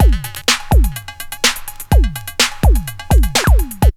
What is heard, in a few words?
BREAK